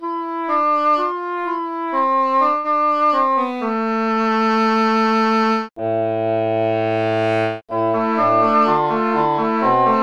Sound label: english horn